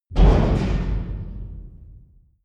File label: dark sheet